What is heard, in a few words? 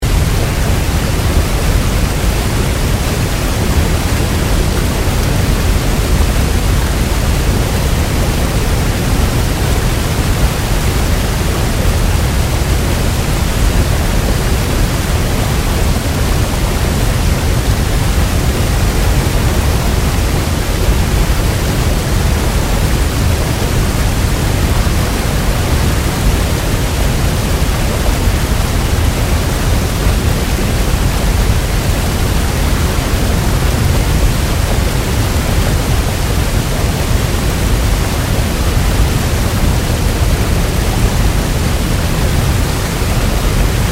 watery falls; inFamous; PS3